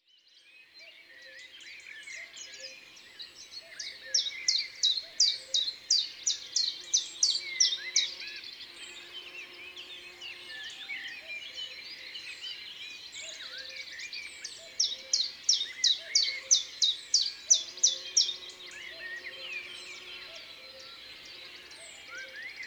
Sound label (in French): Pouillot veloce, Concerts naturels de l'Isère; Studio Les Trois Becs